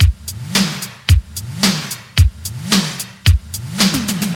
110 bpm drum groove f key, Free breakbeat sample - kick tuned to the F note. Loudest frequency: 2191Hz